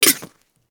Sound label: Close the shaker lid